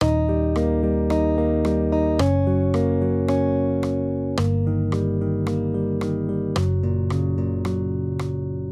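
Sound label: Guitar, Accompaniment, Major Triads, Eb-Gb, 110 BPM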